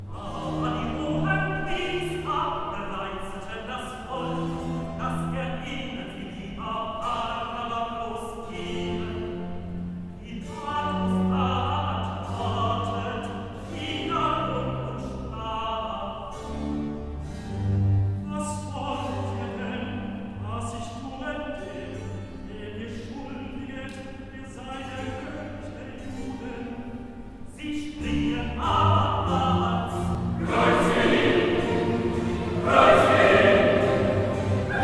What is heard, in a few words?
Recitativo; Keiser; Jesus aber antwortete nichts mehr